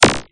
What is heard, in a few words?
Underwater Clam Open; Quicksilver